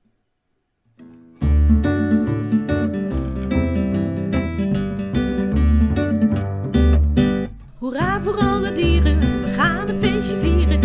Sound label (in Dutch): dierendag met zang